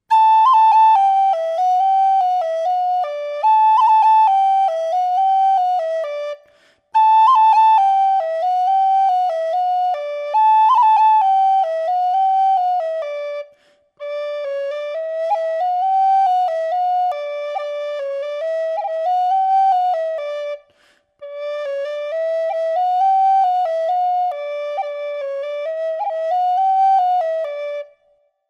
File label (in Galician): trad, Pito Galego